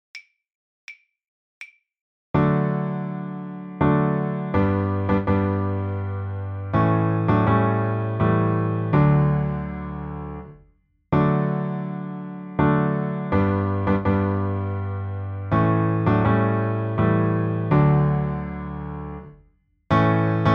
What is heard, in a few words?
accomp, level2violin; The Violin Fun Book (Level 2); Gaudemaus Igtur